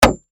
Hammer Hit Wood, Industry, Hammer, Hit, Wood, Hard, Impact